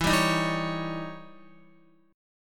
E Minor Major 13th E down Guitar; EmM13; 4F,4A,5C,5G,5C,6E; strummed; JGuitar

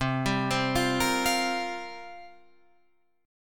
C 7th Suspended 4th, Guitar Drop D, chord

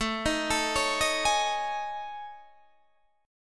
A Major 7th Flat 5th, down Guitar Open D

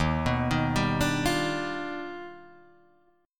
Eb Augmented Major 9th Eb down Guitar Open D

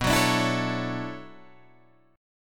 B Minor 11th B down Guitar DADGAD; strummed

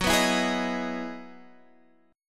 E Major 9th F sharp, chord; strummed; JGuitar

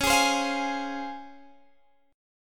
Db Major 7th; strummed

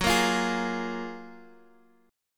F sharp Major 9th F sharp, Guitar Half Step Down

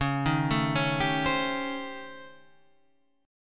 A 7th Sharp 9th Flat 5th

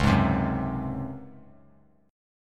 Db Major 7th Suspended 4th Db down

